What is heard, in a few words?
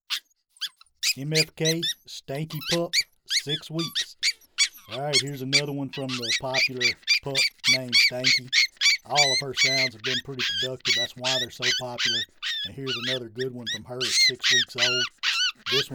Pup 6wk, MFK Game Calls; Stanky